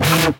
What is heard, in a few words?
Synths E; Vengeance Samples